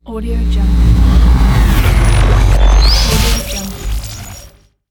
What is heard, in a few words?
futuristic sound effect